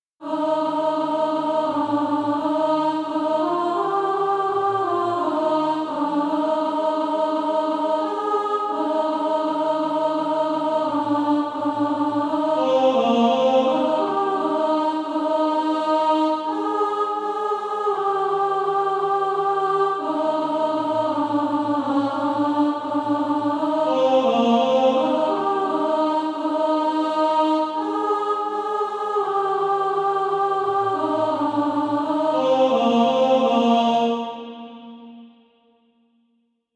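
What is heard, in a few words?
alt, Practise Music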